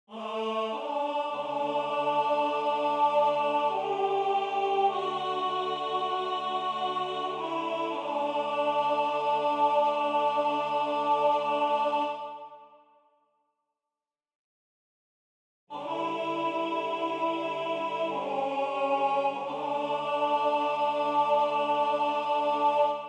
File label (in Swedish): SOPRAN ALT